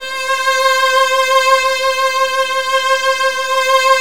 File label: Virtuoso Strings C3; ILIO; Kirk Hunter Virtuoso Strings VOL-2